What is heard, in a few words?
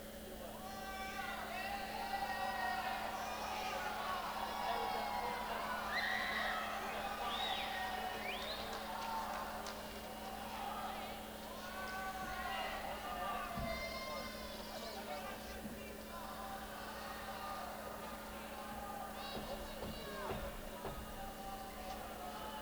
Tuning